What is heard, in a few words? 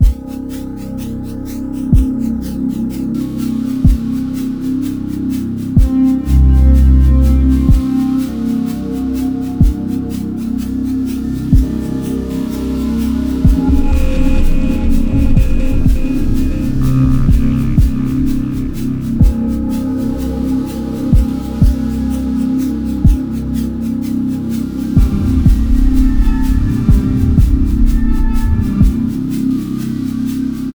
terrible fear